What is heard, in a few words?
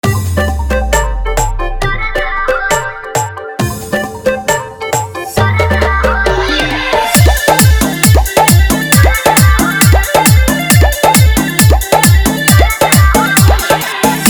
Full Hard Bass Dance Mix; Holi